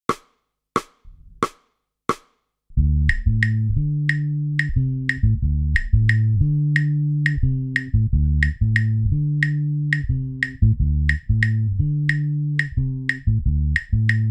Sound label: tumbao 3-2 off clave